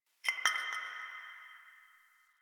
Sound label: Interior Distant Marble Hit; Warframe; Ambience; Nineteen Ninety Nine; DE